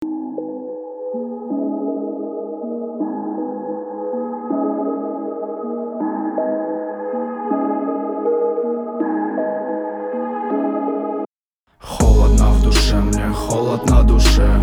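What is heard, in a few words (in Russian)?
пацанский рап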